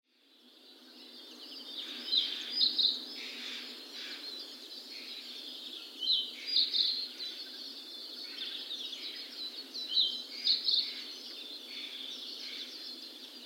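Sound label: Narcissus Flycatcher; kibitaki; shigakogen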